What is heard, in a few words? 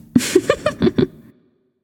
laugh; smite; artemis